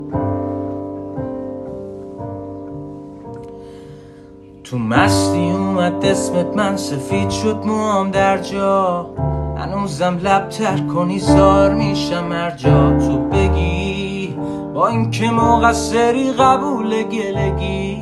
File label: music shad car